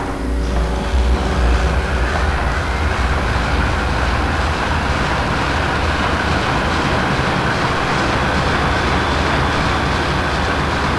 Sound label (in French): Pompe